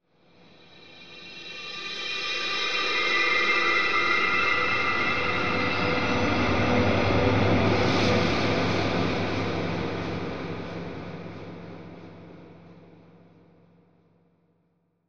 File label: Ghost Swirls; Horror; Blastwave